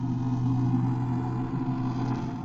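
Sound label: remnant loop; Void Spirit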